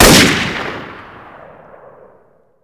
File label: new scout; garrysmod